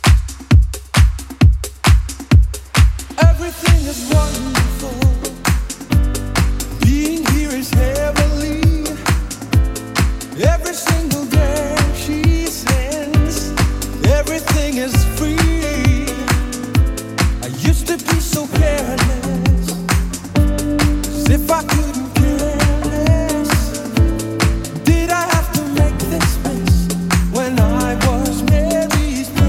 80s Club 133 bpm

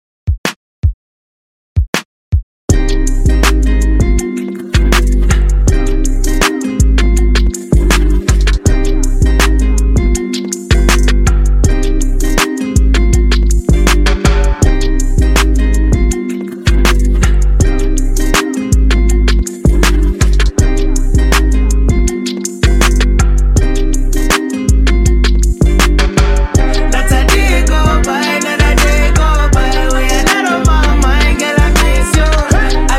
Top 40; Redrum

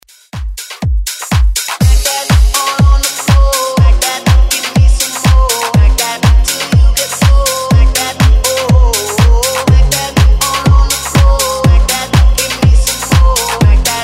House Redrum

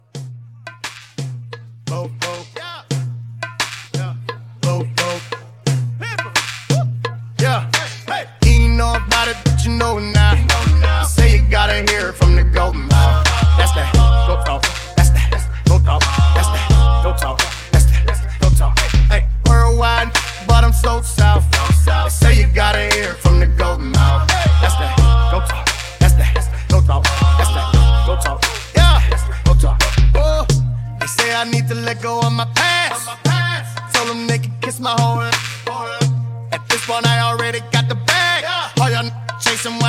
Hiphop Mashup 87 CLEAN

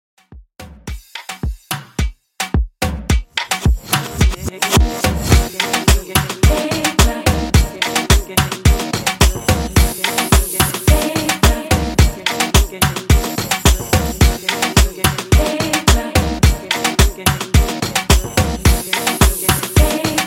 90s Hip Hop Moombah, 108 Bpm

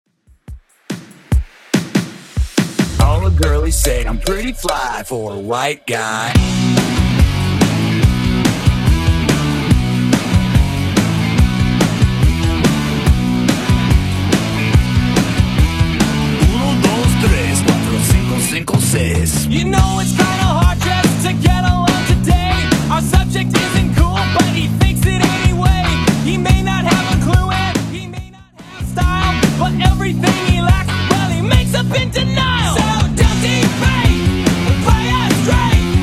90s Rock Redrum 143 bpm

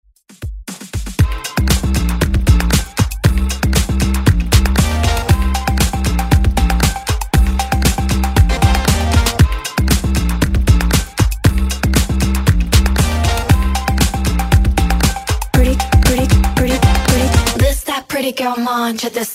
KPop Redrum

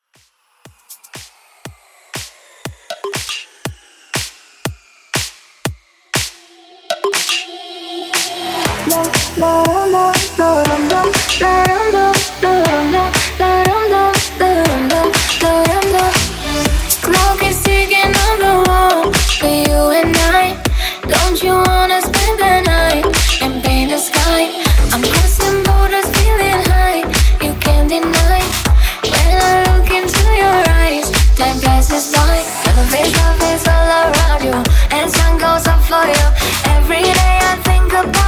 House Club 120Bpm

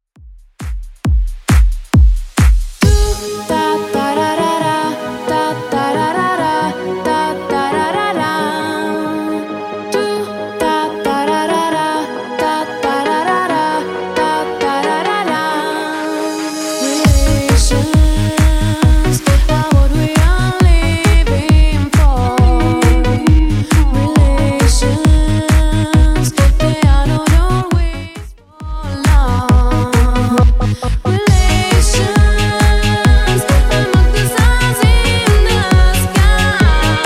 90s, 135bpm

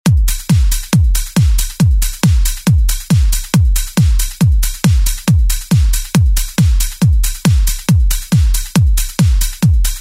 90s Re Drum; DJ Mhark

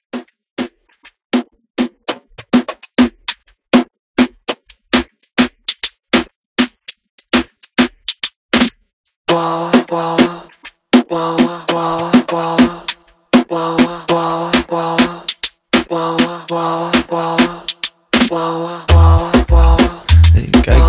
Latin Club 100

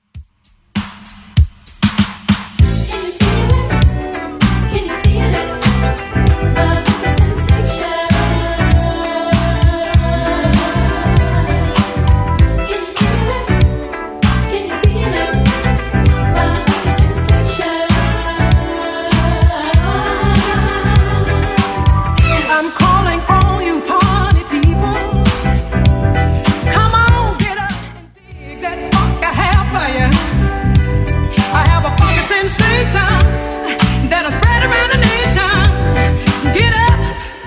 70s Redrum, 98 bpm

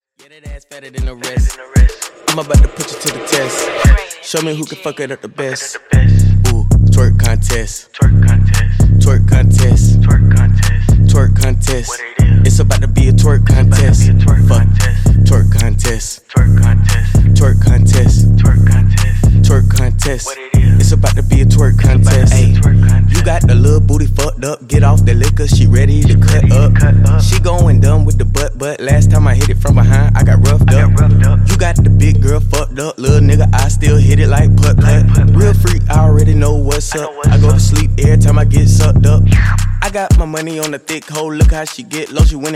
Dj Intro Outro 115 Bpm

